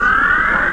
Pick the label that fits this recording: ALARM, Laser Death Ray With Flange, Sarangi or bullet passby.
ALARM